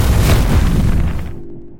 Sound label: DUCATI